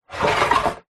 wooden sliding toilet roll storage tower slide open or close